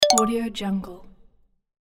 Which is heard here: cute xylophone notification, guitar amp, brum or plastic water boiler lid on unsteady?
cute xylophone notification